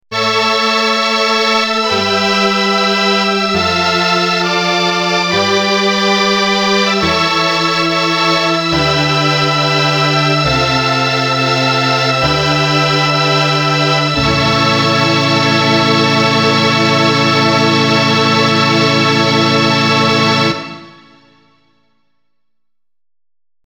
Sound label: string melody 2 Orchestra; logan